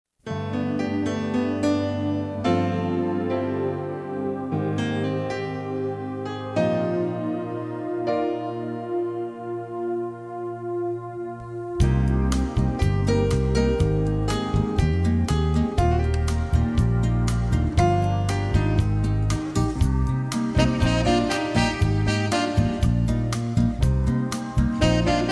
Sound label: contemporary music